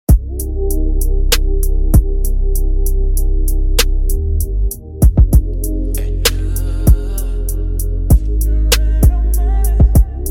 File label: Waves